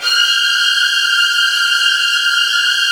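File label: violin trills; Virtuoso Strings; ILIO; Kirk Hunter Virtuoso Strings VOL-1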